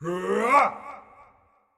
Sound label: Effort Long; Marv